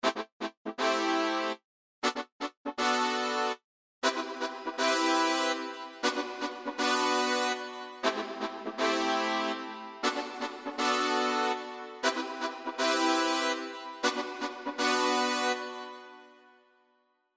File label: Lexicon Plate Brass